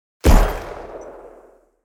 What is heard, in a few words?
gun disparo